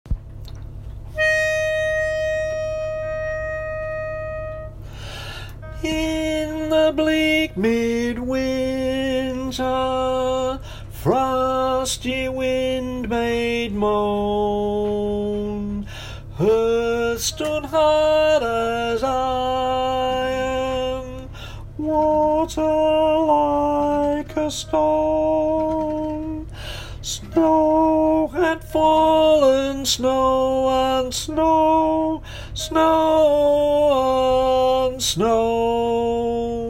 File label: Tenor